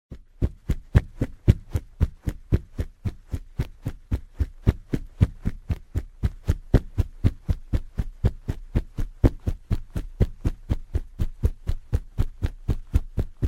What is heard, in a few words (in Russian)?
zvuki begemota